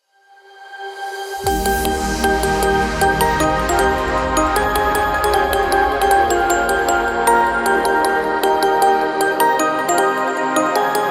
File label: Jingle Bells Hardstyle Remix